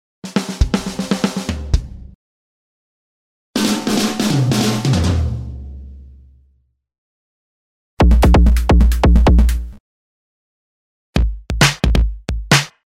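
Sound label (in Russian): барабан, конец, короткая музыка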